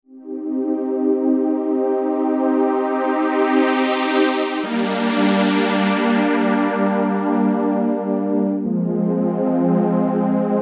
microfreak V4 Presets

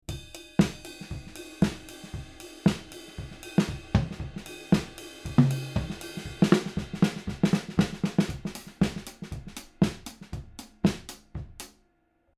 Neumann V402 Drums OH High Ribbon; Extinct Audio Valkyr

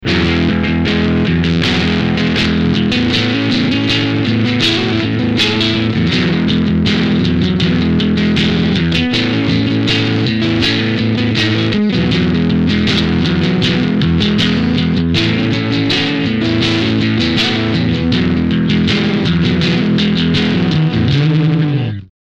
concorde more drive

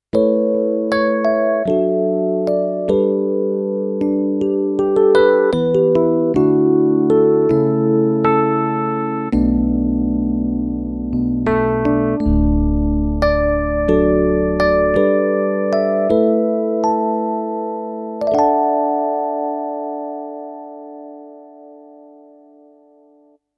Yamaha P-S500 Suitcase E-Piano

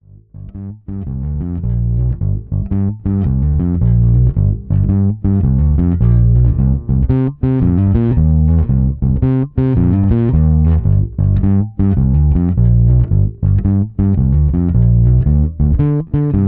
Fender More Overdrive

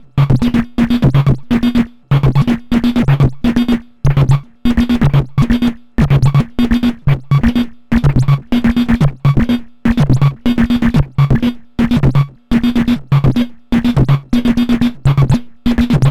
Drums Liquid Glitcher COMP und Pitch per LFO; Error Instruments

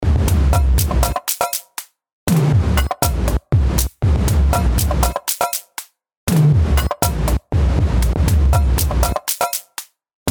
DrumComputer Disto Bounce

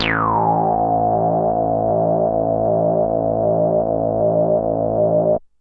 ARP Odyssey Filtered Detuner

